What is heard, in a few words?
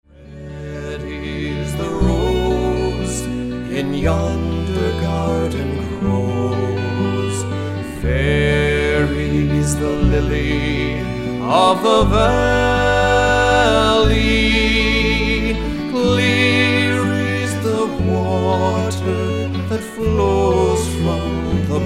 Irish Band